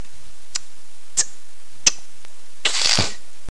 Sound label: hit and hat